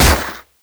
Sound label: Akimbo Pistol Shot; Grineer; Weapons